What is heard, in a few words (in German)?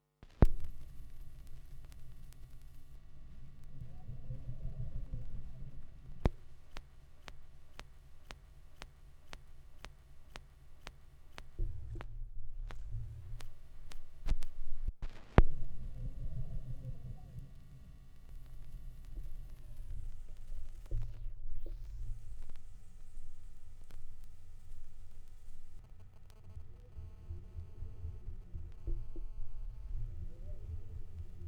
schallplattengeraeusche, Vinyl; wendelrekords 02